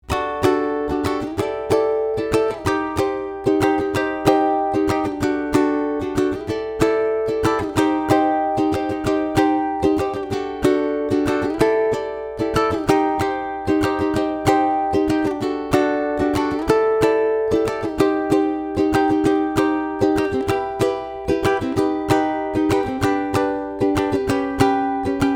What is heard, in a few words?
strum